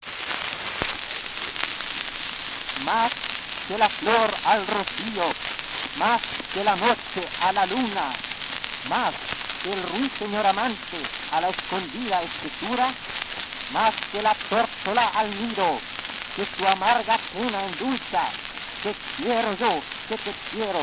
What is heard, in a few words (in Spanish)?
versos y canto; 5 inch Berliner; The Berliner 12.5 cm Records